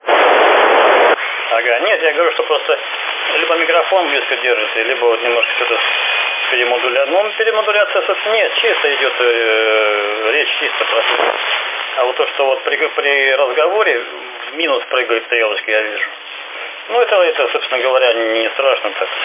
khz nfm

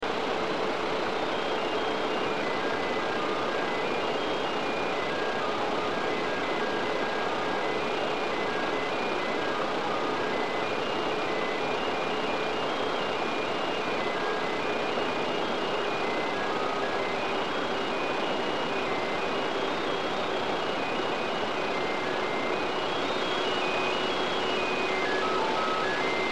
signal

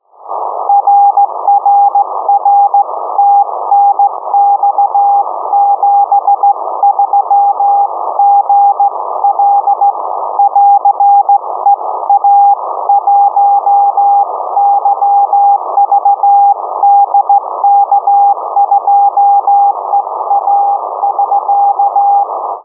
50086 khz cw

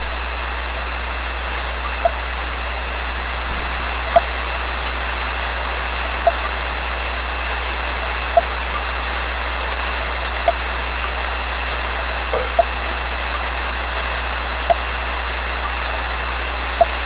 drops

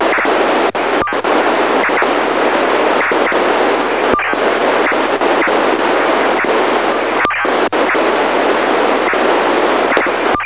digital

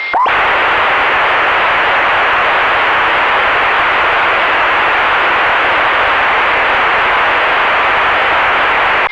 digital

